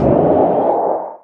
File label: weapon fire